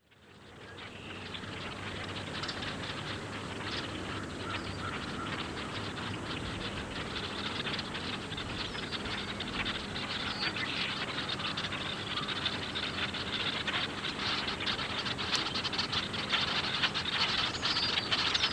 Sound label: blackbir